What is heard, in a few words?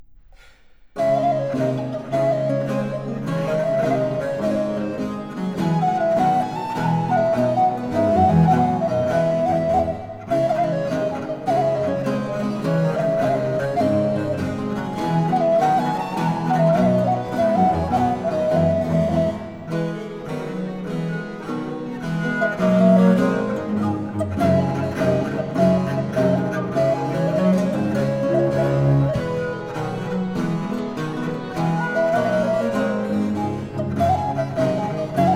Suite in D Minor for Recorder Jigg